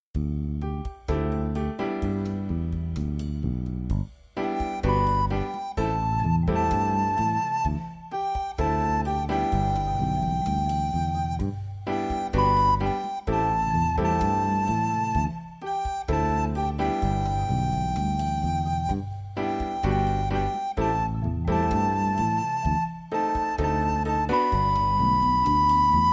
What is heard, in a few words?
Big BAG Gym BAG Jazz